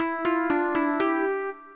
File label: Connect; Alcatel OT-756 PC Suite